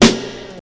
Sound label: subtle reverb steel snare drum sound a sharp key